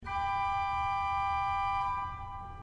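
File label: quint 3 C, organ; srednja vas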